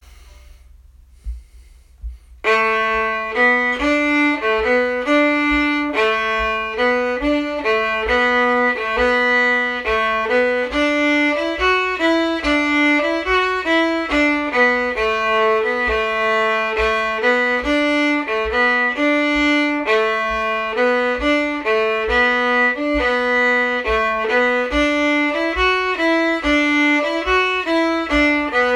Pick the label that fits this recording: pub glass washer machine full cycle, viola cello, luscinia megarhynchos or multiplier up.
viola cello